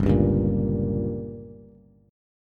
G Diminished, Bass; strummed; JGuitar

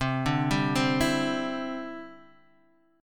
E Minor 7th Sharp 5th C

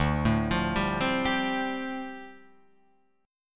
D Minor 11th D down Guitar Open F; Dm11 arpeggiated

